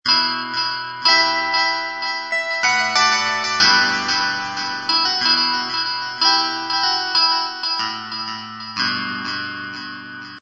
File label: Loops, 93 BPM E minor